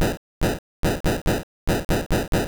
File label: Belly Drum; sfx; attack moves; pokemon; gen 2; gsc